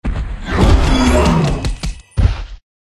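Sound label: Pet Gorilla buff; pets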